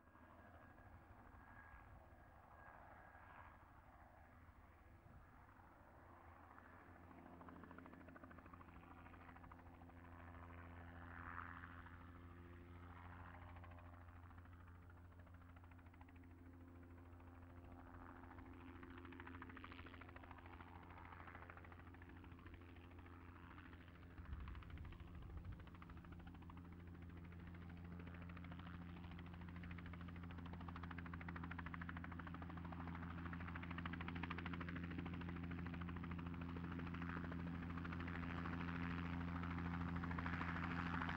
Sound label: Helicopter; Sound Ideas 6019